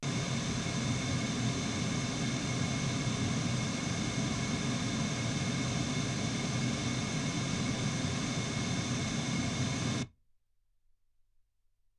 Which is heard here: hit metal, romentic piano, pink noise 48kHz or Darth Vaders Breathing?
pink noise 48kHz